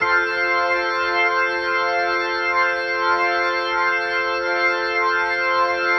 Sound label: Progression, Organ, Loops